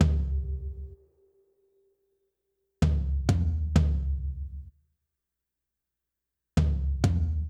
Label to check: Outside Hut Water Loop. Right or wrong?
Wrong